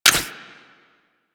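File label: Primary crossbow Mech; Warframe; Tenno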